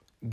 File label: Guitarra (Guitar)